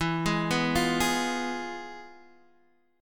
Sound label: F Diminished E; Fdim/E arpeggiated; JGuitar